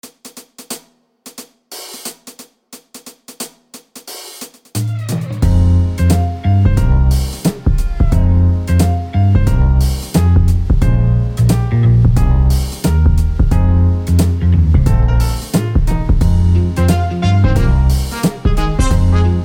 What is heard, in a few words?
Jazz Record